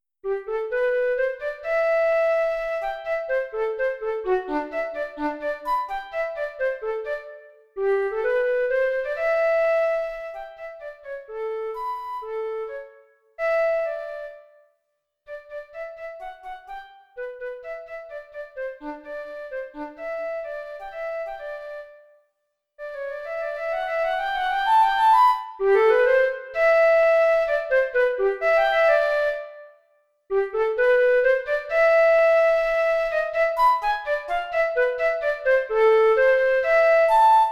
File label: Flute; Band Audition